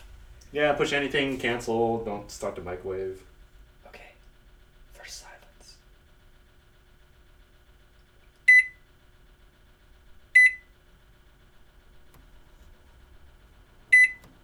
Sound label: Beeps and Toaster Oven; Oven Door; Cooking; Turkey Cooking Simulator